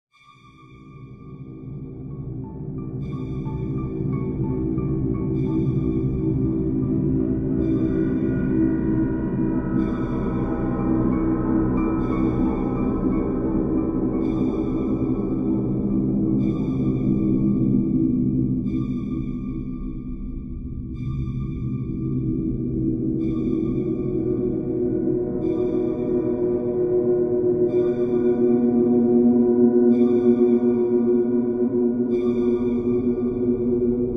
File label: Texture Horror Uneasy Twinkles Drone; LYN115 Trailer Production Elements